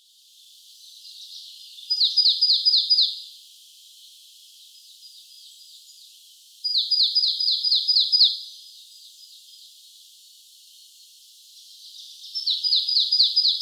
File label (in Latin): Poecile palustris; SETE